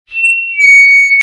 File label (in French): Larsen long